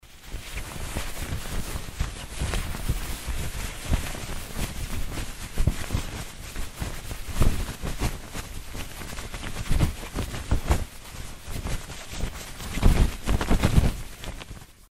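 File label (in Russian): выбираем вещи в шкафу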